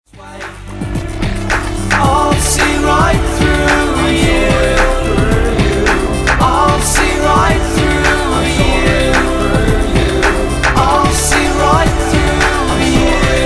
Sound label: sawtooth